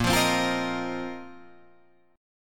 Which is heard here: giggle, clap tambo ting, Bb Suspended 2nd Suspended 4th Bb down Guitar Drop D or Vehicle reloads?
Bb Suspended 2nd Suspended 4th Bb down Guitar Drop D